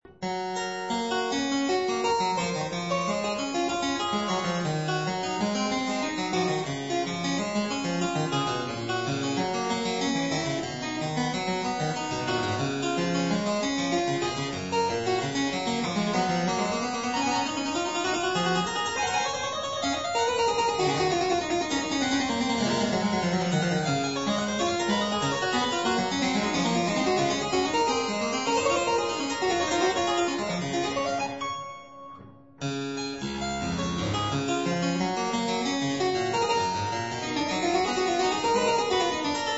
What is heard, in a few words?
Variatio 26 a 2 Clav.